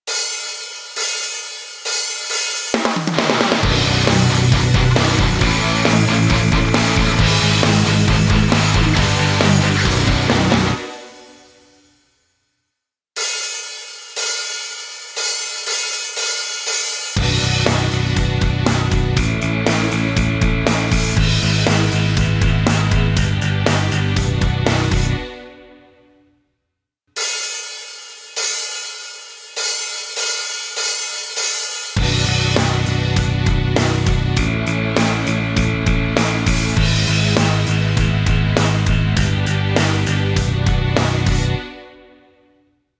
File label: karaoke